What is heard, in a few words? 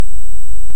Hydrophone; OneOcean